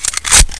mag in, deagle

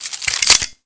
mag out, deagle

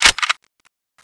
m200 clip out, weapons

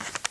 m4a1 silencer screw, weapons; csgo

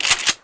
pump, sawedoff, weapons; csgo

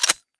bolt release, tec9, weapons; csgo

